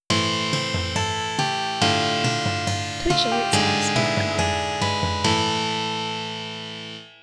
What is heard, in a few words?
Medieval Follower